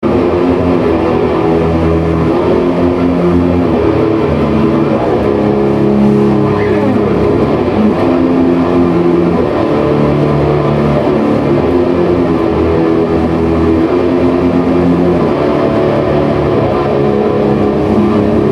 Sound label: Bouzouk